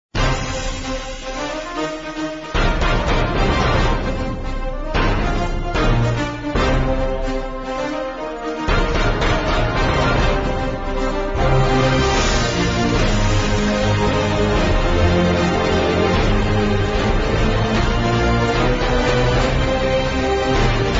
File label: music; crewman clash